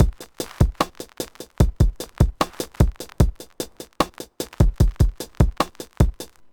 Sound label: Rims, Drum Loops Minimal; Zero-G Smoke